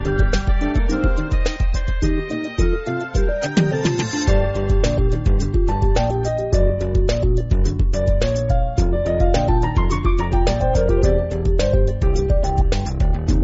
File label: pool stage soundtrack; pvz 1